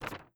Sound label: paper drag stop; Papers Please